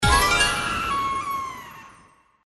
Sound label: Win Higher Symbol; slots; Halloween Blast